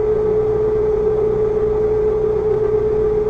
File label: retarder, volvo 9900; Vehicles; AI volvo 9900; Freyfurt